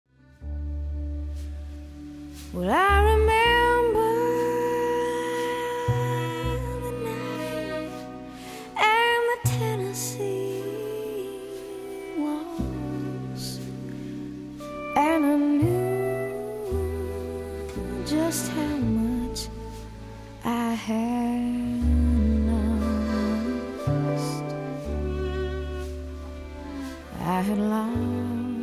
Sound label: waltz; tennessee